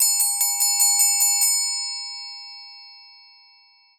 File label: Double Triangle; Samsung Galaxy Watch 8; ringtones